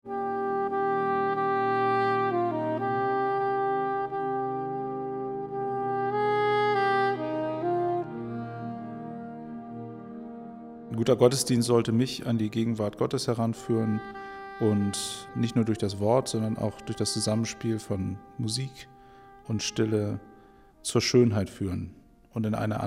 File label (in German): Jazz Kirche